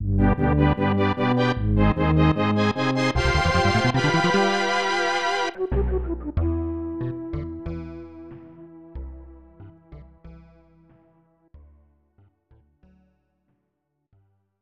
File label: Tada; Heisei Shin Onigashima; snes